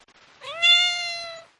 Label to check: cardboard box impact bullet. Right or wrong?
Wrong